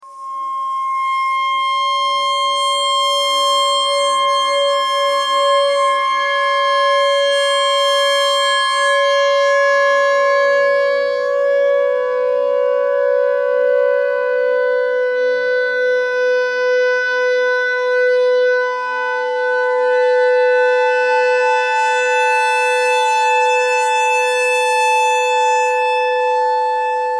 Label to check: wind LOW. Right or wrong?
Wrong